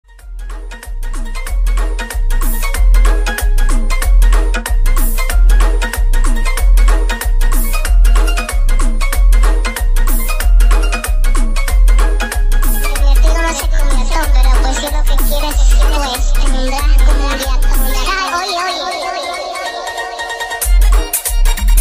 Cumbia Remix Bass Boosted

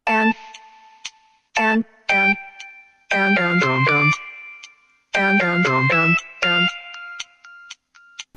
Wednesday Samsung

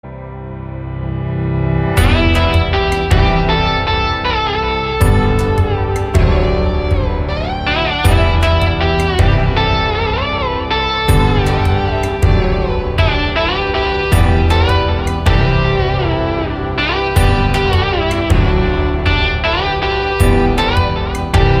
Indian Classical Version

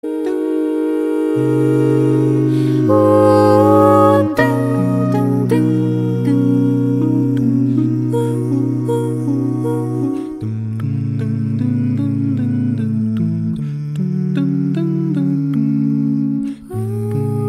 Acapella; Minecraft

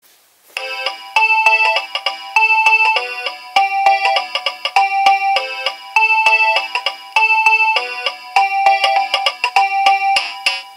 Nokia 2300 Ringtones

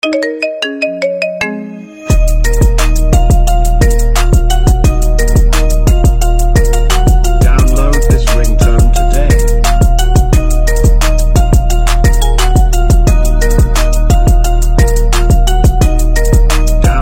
Marimba Remix

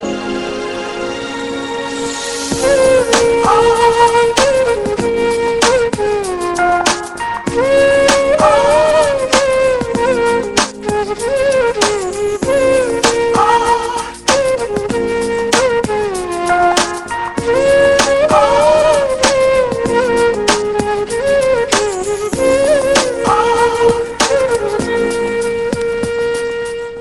flute

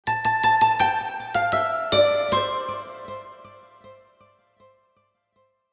Christmas Notification; HTC Stock

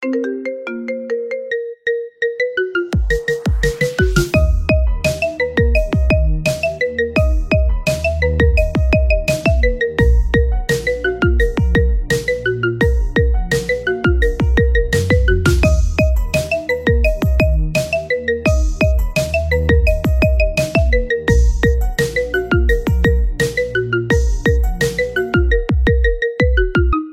Marimba Remix

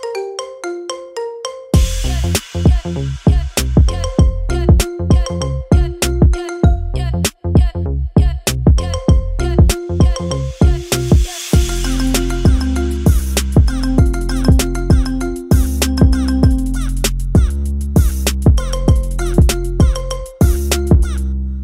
Marimba Remix

